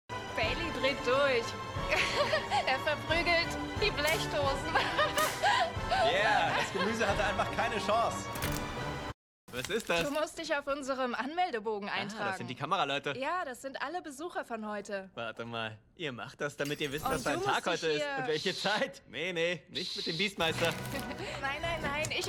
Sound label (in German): Lachen